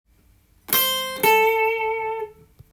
blues, guitar; blues short phrases tab

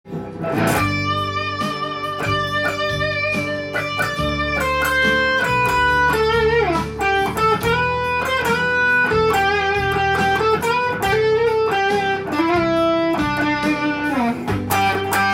eleki pop solo, guitar

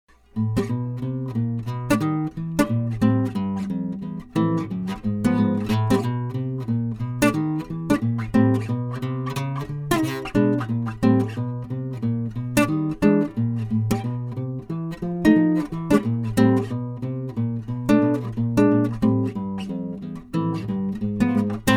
Bass guitar jazz

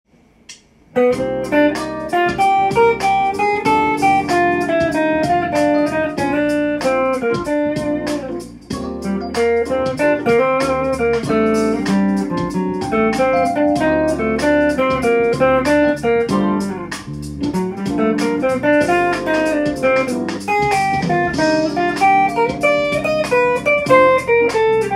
solo jazz, guitar

